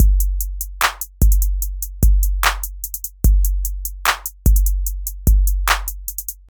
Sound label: Hip hop